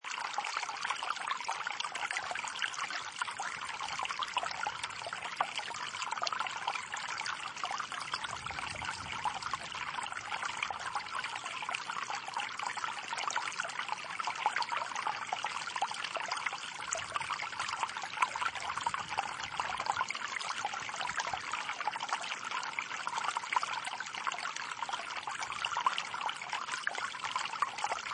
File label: Small Stream Loop: Water trickling down a very small river, creek, brook or s; Calm and peaceful river sound. Seamless loop.